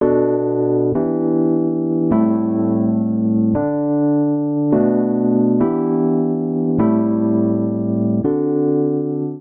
Progression Uptempo Long Notes iii - vi - ii - V G Major 102 bpm, Electric Keyboards; Ableton; Factory Packs